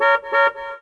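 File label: honking; troubeni; Mafie 1 cz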